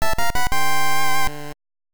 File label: Get Minor Item; Legend of Zelda The Link's Awakening 1993 gb